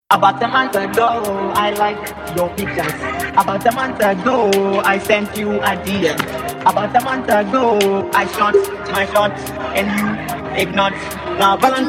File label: Drums